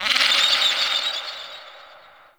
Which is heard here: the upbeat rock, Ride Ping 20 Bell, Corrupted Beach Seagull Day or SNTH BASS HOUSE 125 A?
Corrupted Beach Seagull Day